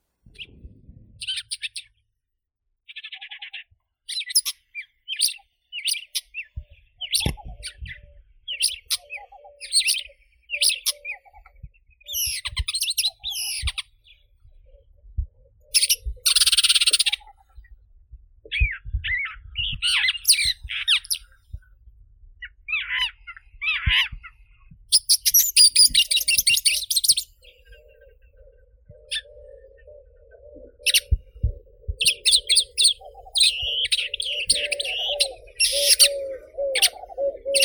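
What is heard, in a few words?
bay-backed shrike, Lanius vittatus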